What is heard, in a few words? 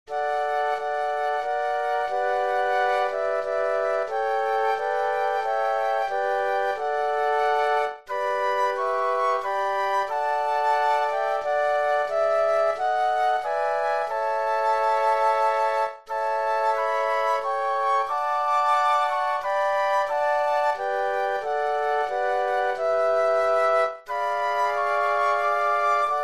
Flute Quartet